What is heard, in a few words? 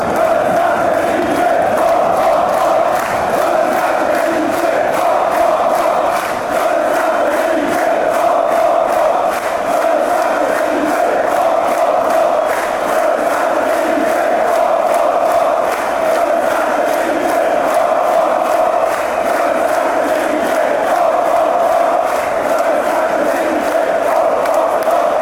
hop hop hop, chants